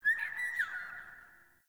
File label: Day Bird; Ambience; One Offs; Glades Of Andurin